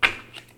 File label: wood hit